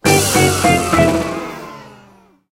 bubble lose; game rip; line bubble

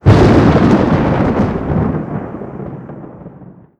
Lightning; luigis mansion dark moon; 3ds switch

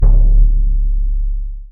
Bd Deep

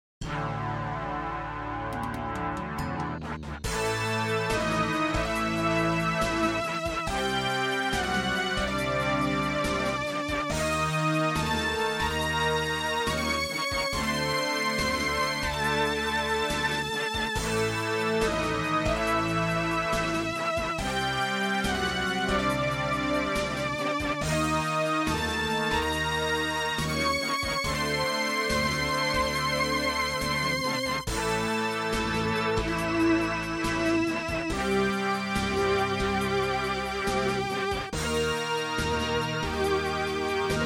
MIDI Scene

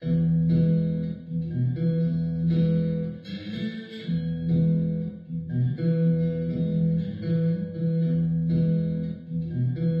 radio tutorial, Flashback Act 1 music; Deadlight

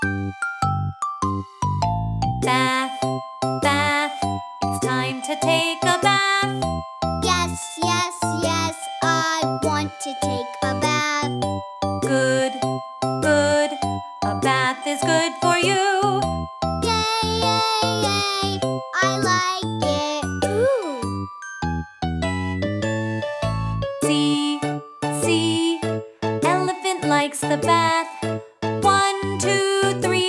Bath song; cocomelon play with jj; android

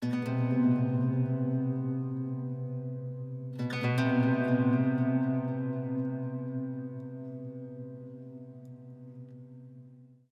Market Front

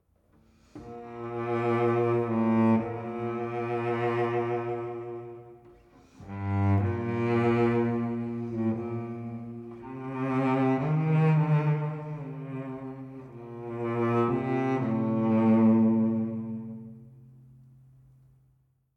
Savannah Music

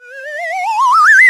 slide whistle up; birthday party bash; wii

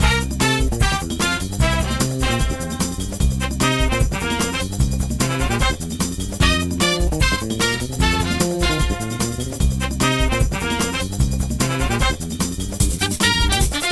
Tournament Winner Ditty

